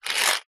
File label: money coin australian 50 cent slide short on tiled table; zapsplat